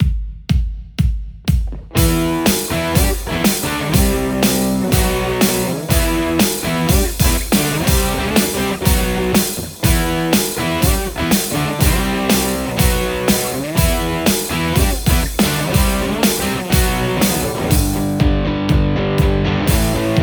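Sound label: Stratocaster; acme mrtaterhead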